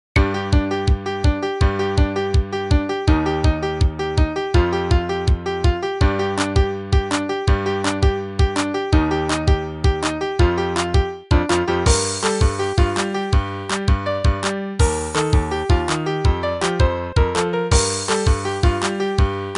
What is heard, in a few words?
Midi; Geometry Dash; Synthesia